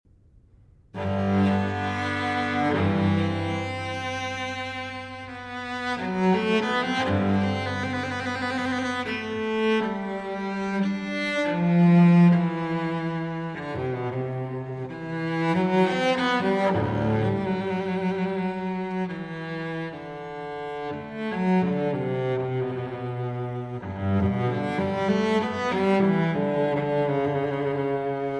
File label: Cello Suite No. 1 in G Major, Sarabande; BWV 1007